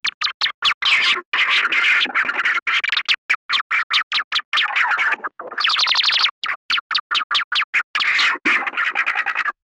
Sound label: drift phonk